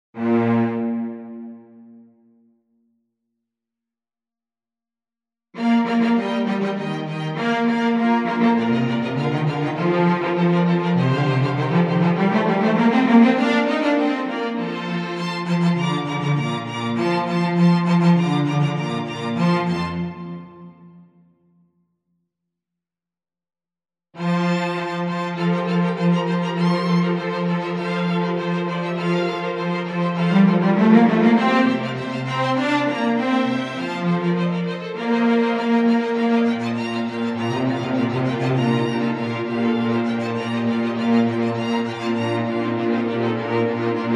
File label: instrumental; Handel; Messiah